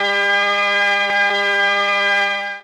c1, Pipe Organ, ORCHESTRON; Retro Sampler

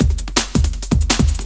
another break, breakcore

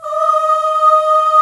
MIXED CHORUS

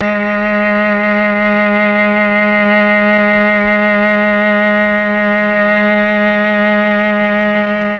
G#3 Mellotron cello; Mike Pinder - Mellotron Archive CD

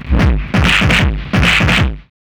MD 909 150, Hard & Loud Techno

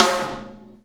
Snare Shard; Sonic Foundry (Sony Creative Software) - Processed Drumkits Zero Gravity Beats; Shards

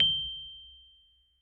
G7 MID, Rhodes Mark II; CEPCo Sample Series Vol. 3

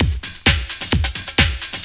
drum 130 groove; Techno Trance Essentials

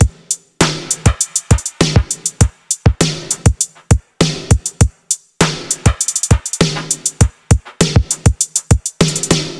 100 Beat; Zero-G; Future Wave; Beats

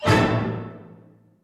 D2 STAB; Zero G; Man Machine

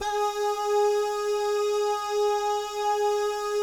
BAH GUY, Jazz FX; Spectrasonics; Vocal Planet; JAZZCOMBO

